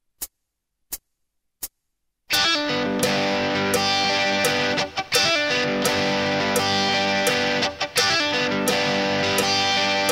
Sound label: fill in